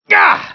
damage

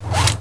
chain pull, weapons

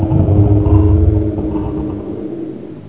die; kingpin

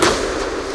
IRIS SLAM

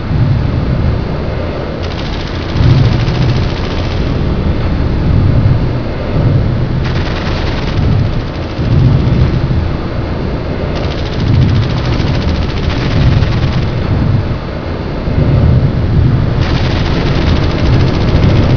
inside

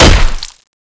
pipe hit body

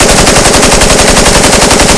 tmp shoot loop

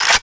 type100 mag out

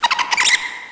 rat alert

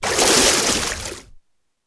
exit water

